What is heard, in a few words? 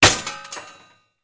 Bullet Impacts Metal